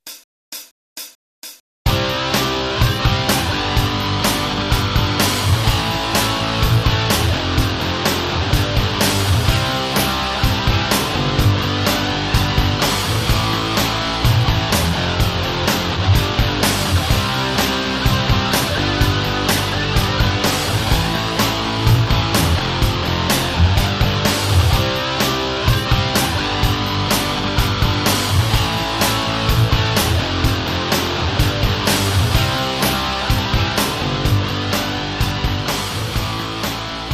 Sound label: jam